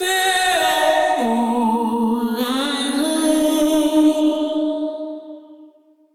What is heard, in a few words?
Vocal Sfx Voice